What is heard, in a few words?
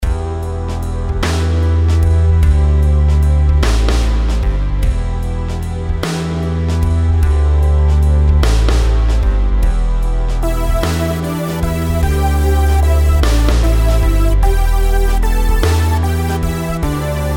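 melodies compound 8s E minor; melody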